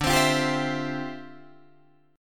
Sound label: D Minor 11th D down Guitar Drop D